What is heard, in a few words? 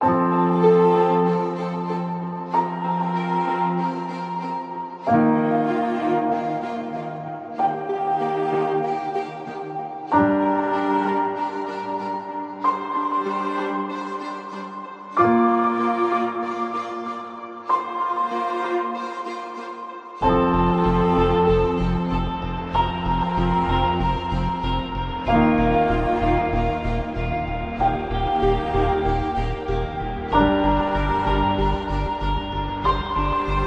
Documentary History